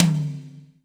high floor tom one shot d sharp key; tom drum

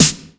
good snare sample d sharp key; Duration 0:01; snare drum samples

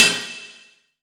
long ride cymbal sound e key

